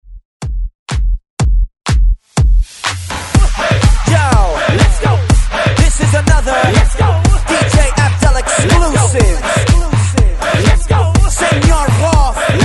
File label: Hype Re Drum 123 Bpm; DJDOLLS